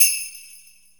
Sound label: Tambourine